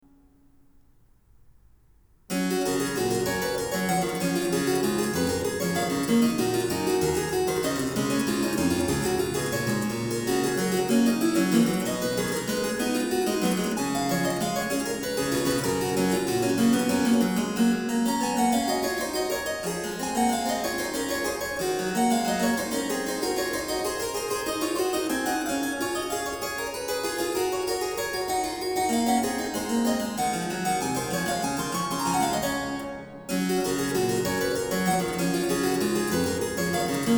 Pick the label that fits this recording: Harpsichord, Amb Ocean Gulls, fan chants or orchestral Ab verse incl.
Harpsichord